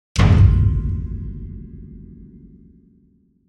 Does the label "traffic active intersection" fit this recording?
No